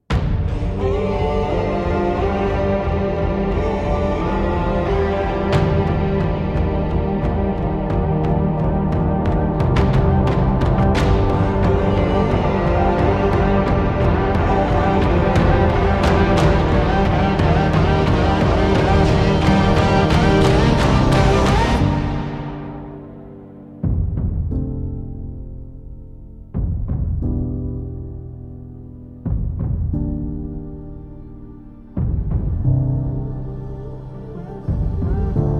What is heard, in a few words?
Instrumental